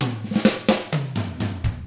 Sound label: 127 SURF ROCK